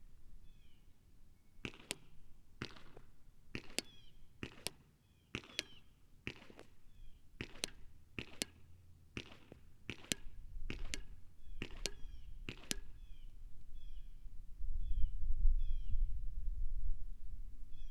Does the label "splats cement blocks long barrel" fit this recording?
Yes